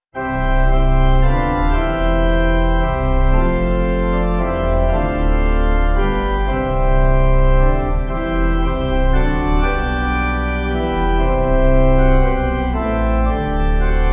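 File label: Pipe, hymn backing; Lift Up Your Hearts; Magda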